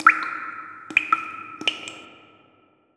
Cave drops; 100rogues

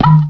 Mine shot; TinyTanks

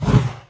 Skill holy fires; 100rogues

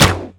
Bow release; 100rogues

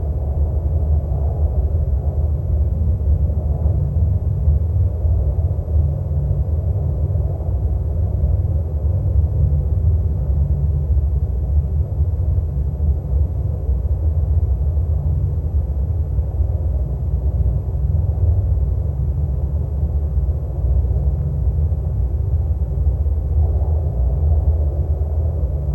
Cave ambi; 100rogues

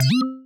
UI enter; Megaplane

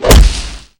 magic crystals; 100rogues

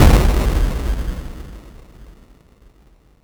Blowup; Megaplane

